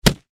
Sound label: Impact Boxing Glove Hit; Sports; SFX Bible 6